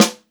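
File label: groovy snare drum; Funk-Groove Drumloops; USB Soundscan vol.38; AKAI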